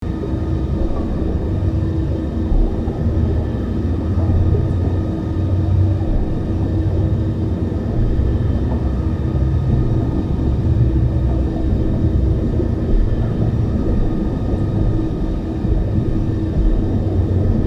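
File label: Ambience Biolab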